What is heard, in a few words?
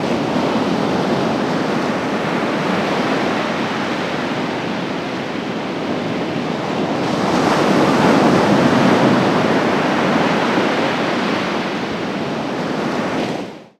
sfx, FX Atmos; Best Service ProSamples vol.36; Chillout